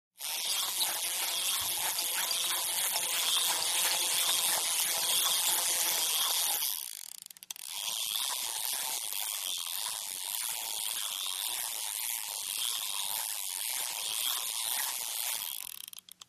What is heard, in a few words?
Fish Reel Light Spin, fishing